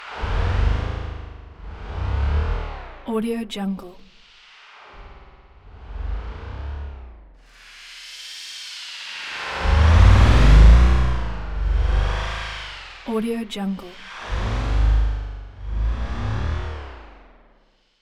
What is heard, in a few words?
time drones bending waves; soundmorph